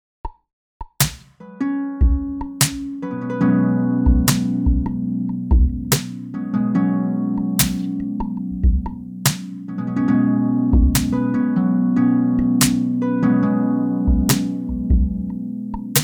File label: Harp